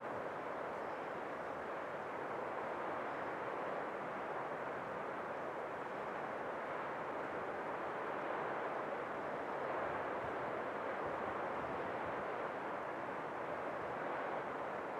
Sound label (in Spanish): cañón del colca plano general; arequipa; viento